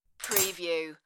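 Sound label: Metal Bar Tool Drop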